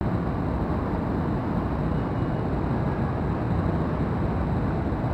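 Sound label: Distant large city rumble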